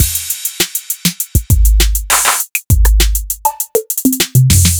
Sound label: acoustic 808s kit; by uppercussion